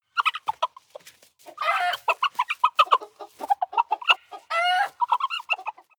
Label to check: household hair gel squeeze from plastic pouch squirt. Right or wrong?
Wrong